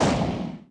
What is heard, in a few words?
Alien Tiny Death; Harvest Massive Encounter